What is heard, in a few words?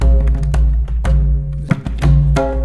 MRIDA, Indian Traditions; USB Soundscan vol.45; AKAI